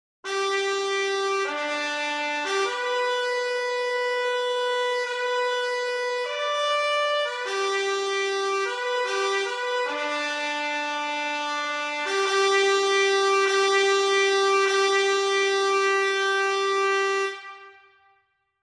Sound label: bugle call; tchaikovsky capriccio italien